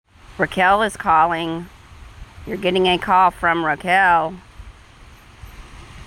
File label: Is Calling Rap, ringtones